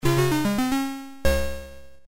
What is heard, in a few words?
End Level; Wonderboy; ringtones